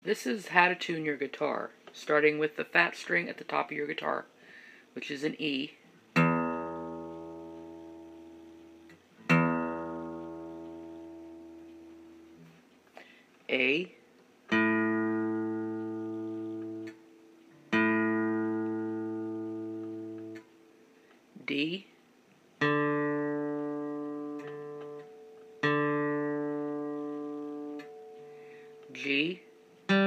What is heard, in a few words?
Guitar tuning standard